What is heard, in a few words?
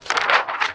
Page turn; magic